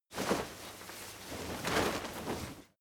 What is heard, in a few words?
crowd movement single soft; bewegung; soundpool